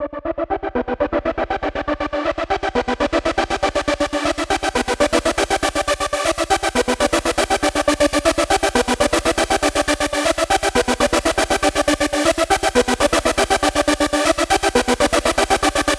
Trance Synth

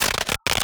Glitches; Antidote Advent

